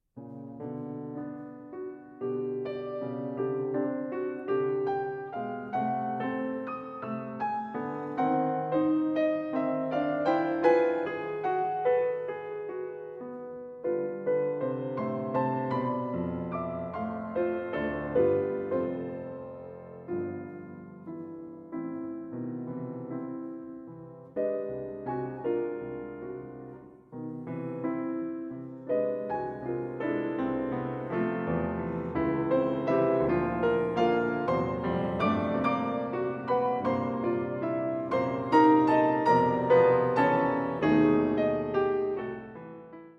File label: Caprices For Piano No. 2 in G Major, Andante Con Moto